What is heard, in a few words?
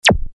syntetic zap